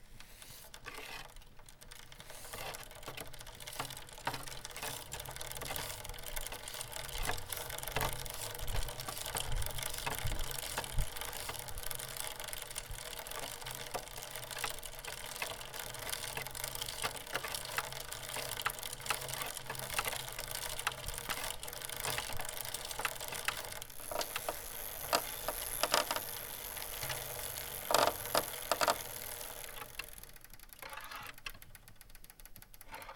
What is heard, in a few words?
Bicycle Ride Clean